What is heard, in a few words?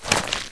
weapon draw hornetgun